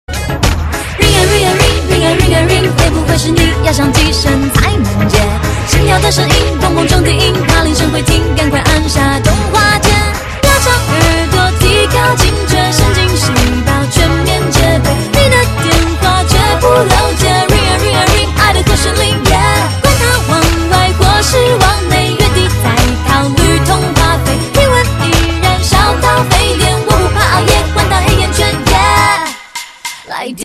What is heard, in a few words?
ring ring ring